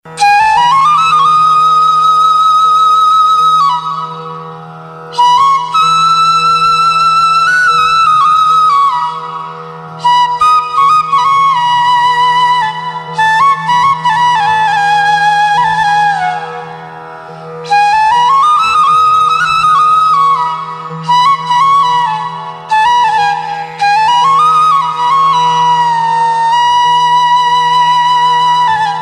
Attractive Flute

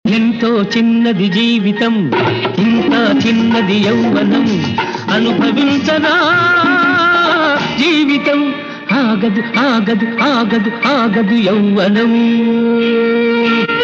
Rington

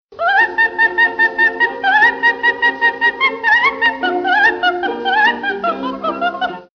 Opera Soprano

